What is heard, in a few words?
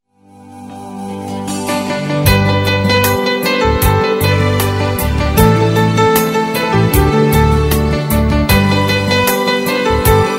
best classical